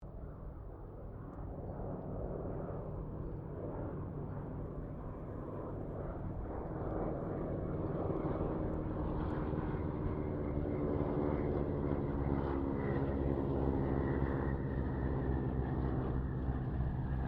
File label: AIRCRAFT C195 SQUADRON 2 PASS DISTANT, Squadron of 2 Cessna C195s; Airplanes (Sound Effects:Vehicles)